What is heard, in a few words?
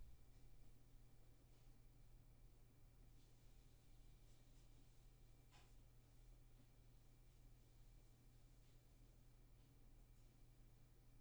roomtone